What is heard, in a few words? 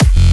Kick Bass F